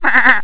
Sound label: baa